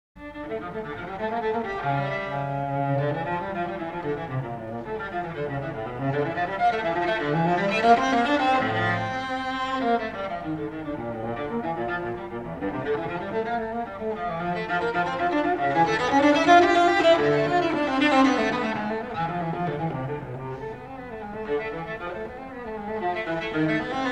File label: cello suite no 2 in d minor courante; johann sebastian bach; bwv 1008